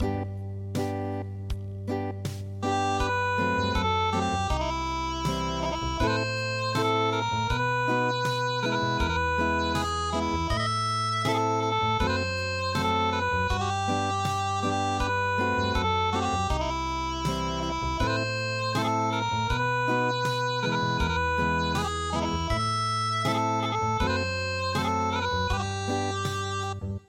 Acoustic

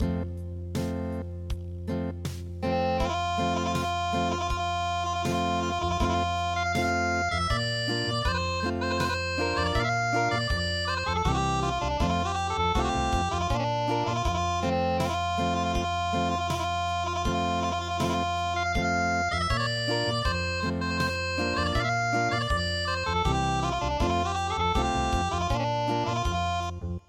Unplugged

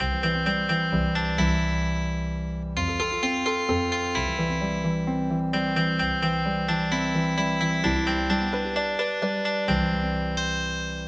Healing Harp; Sally Go Round The Sun

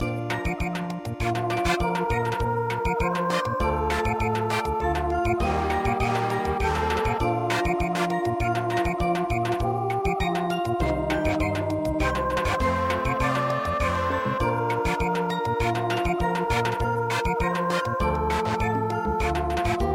Samba

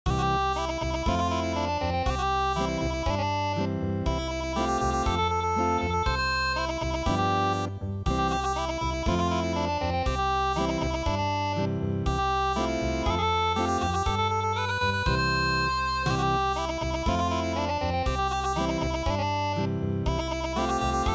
Acoustic; donguri korokoro

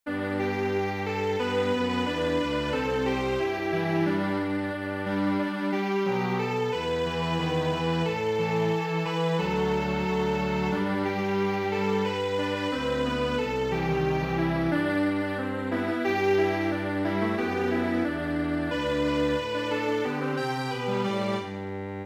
Strings Quartet

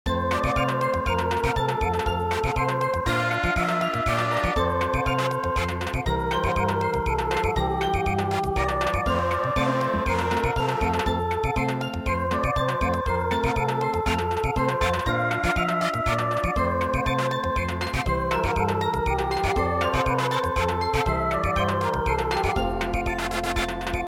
Samba

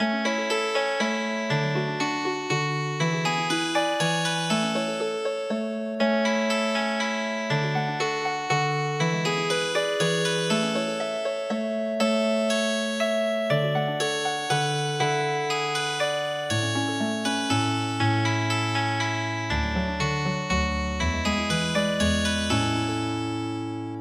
Healing Harp, traditional

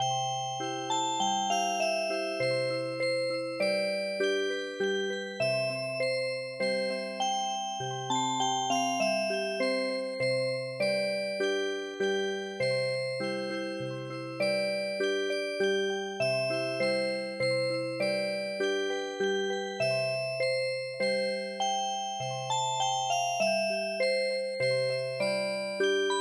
Music Box, midi

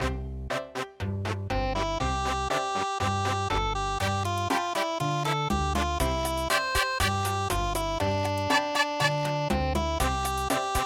Cha Cha Cha; Der Letzte Abend

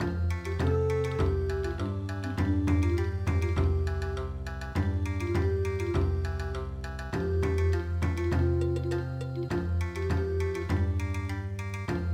Bon Odori

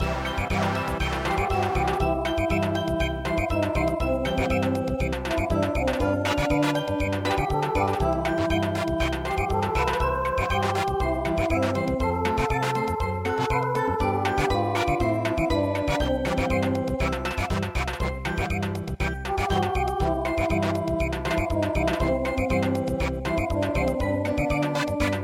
Samba, traditional

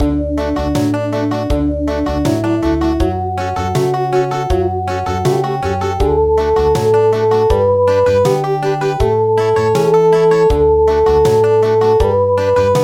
Country

